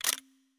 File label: Burst Slow, ui